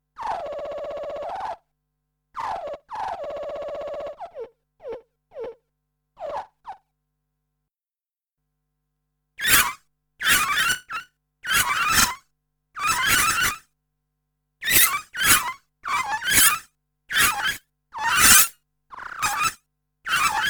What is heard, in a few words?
water birds